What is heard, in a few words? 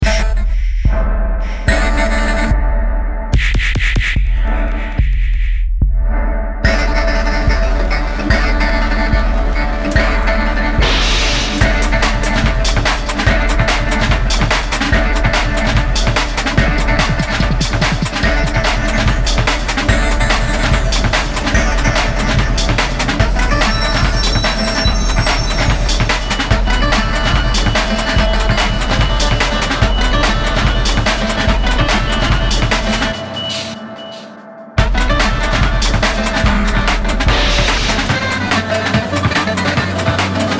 Disfigured Chicken Blues